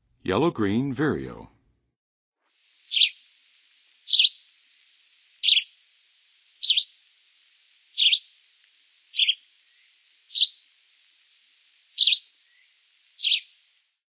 Yellow-green Vireo, Songs of Birds of North America; Western USA; Western USA Songbirds